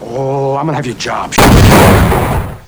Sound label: exploding